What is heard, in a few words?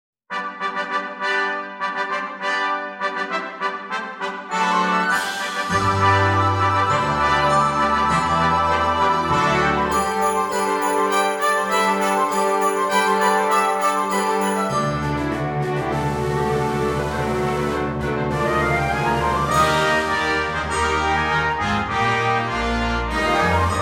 News of Great Joy with Handbells Playback